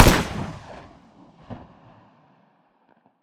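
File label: rifle shot while hunting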